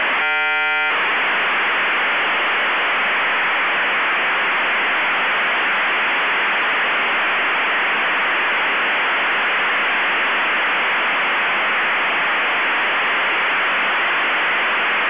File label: modem V32